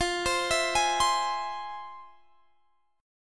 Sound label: F Minor Major 7th, Guitar Standard, chord; FmM7 arpeggiated; JGuitar